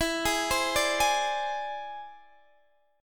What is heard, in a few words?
E Augmented 7th E down Guitar DADGAD